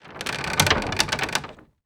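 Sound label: wood creak shipwreck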